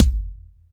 BD, Bassdrums, Roland TD-20

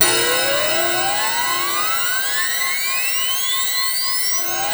B4 SIRE; Northstar; The Wizard VOL-1; KAOSIREN

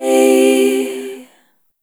Chord C Major; Sonic Foundry (Sony Creative Software); Pocket Diva World Class Vocal Samples

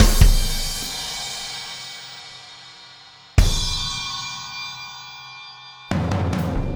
Impact Crater Beat, Processed Drumkits

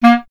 clarinet A#, Solo Instruments; Miroslav Vitous - Solo Instruments VOL-1; 90 s Sample CDs

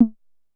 Drum X Cowbell; Pearl Drum-X

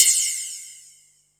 hat, Techno 138 BPM

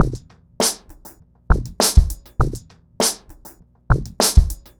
Remixed Drums 100BPM; The Roots Drumloops

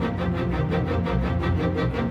Strings; Sonic Foundry (Sony Creative Software); Orchestral VOL-2

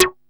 JU 106, SEQ-FX; Masterbits; Vintage Voltage

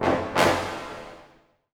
FULL ORC, Symphonic Adventures; East West

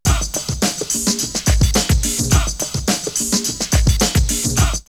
Funky Tones, drumloops, OldSchool; X-Static Goldmine

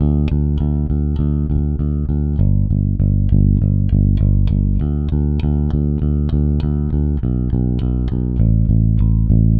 Rock, Bass; Bass X

